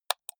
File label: computer mouse left click single hard